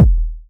kick drum one shot e key; kick drum samples

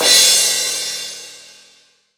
huge crash cymbal drum sound g sharp key

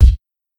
old school deep kick one shot c key

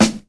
short snare sound f key; snare drum samples

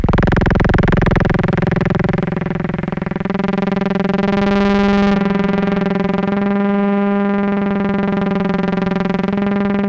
monodelay cm 135, synth; loop

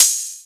thin open hi hat sound g sharp key; Duration 0:01; open hat samples

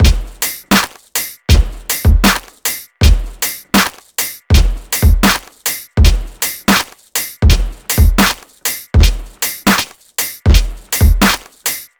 80 bpm drum groove e key

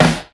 raw hip hop snare sample e key

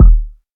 verby steel kick drum sample D# key

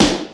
Full Snare Drum D Key, steel snare drum; snare drum samples

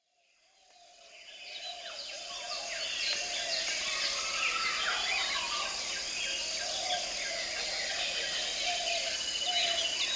orioles - barbets - doves; soundscapes

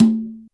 low mid tom sample a key, tuned to the A note; tom drum

